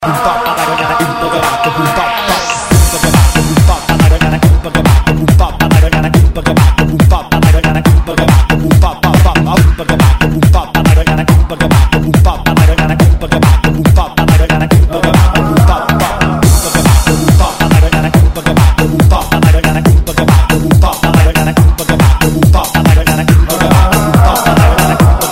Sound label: Nice Mix